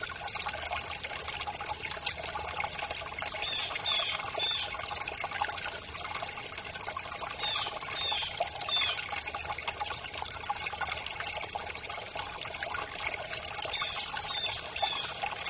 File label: Babbling Brook, WATER NATURE BROOKS